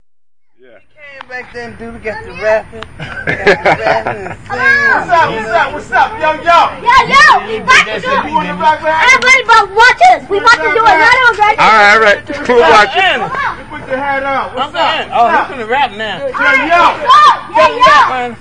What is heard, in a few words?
sidewalk danceoff and laughter lofi, children